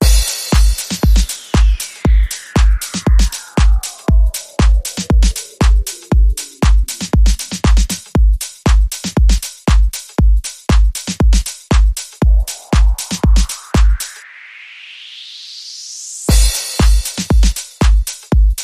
Hungry Horns Beat

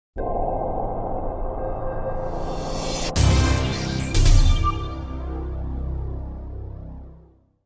Middle Sting

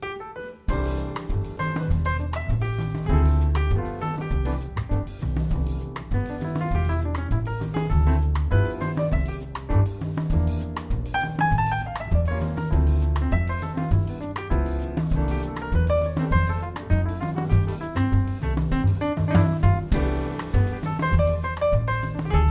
Piano

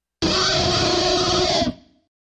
Solo Guitar Riff; Solo Guitar Riff 2

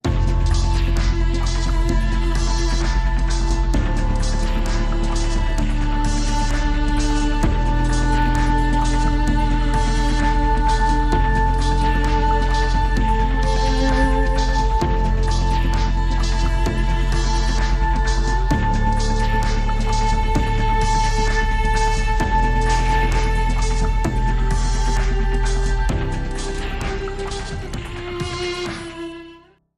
Dark Electronic

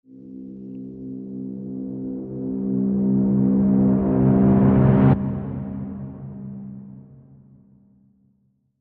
Silent Threat Timpani Reverse

